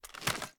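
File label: reload extended mag end; gmod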